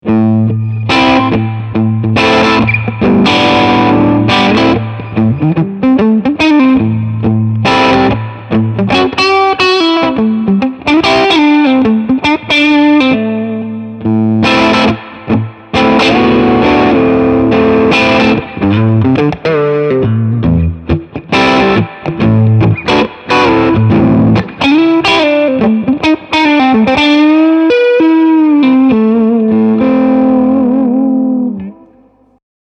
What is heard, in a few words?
BLUES Channel